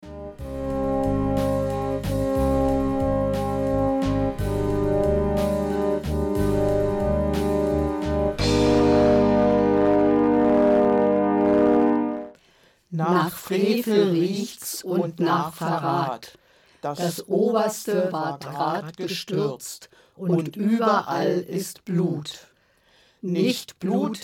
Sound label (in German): Jingle Anspiel